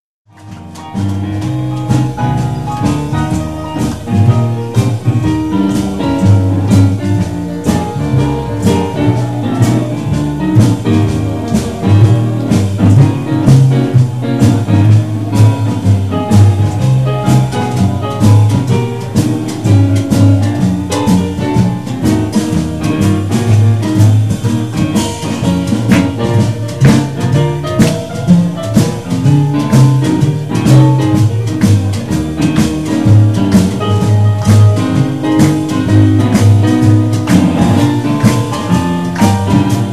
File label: Jazz Band